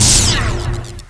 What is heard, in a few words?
teleport; weapons; displacer; player